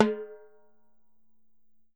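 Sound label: TIMBALE, Salsa Kit; E-MU Producer Series Vol. 7 – Old World Instruments; Drums&Percussion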